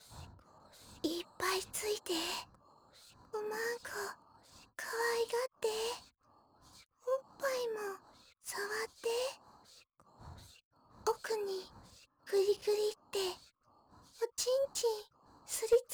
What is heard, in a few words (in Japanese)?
シコシコ ハッハッ セリフ ゆっくり